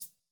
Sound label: hihat